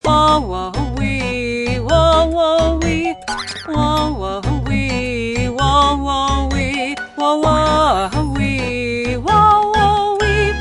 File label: wawa wewe